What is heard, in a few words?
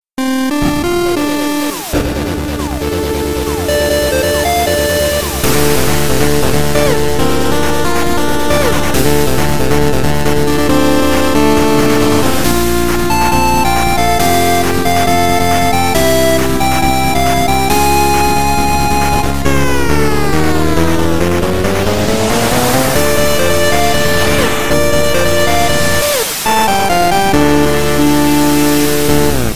8-bit; Beast Morphers